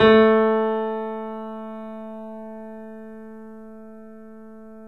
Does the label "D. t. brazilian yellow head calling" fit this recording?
No